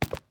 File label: wood step, Footsteps